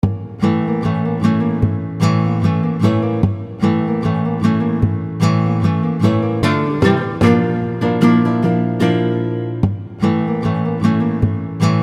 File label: Latin Acoustic Guitars